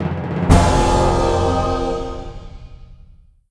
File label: Speed Puzzle Win; pandora's box